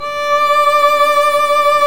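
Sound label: violin, marcato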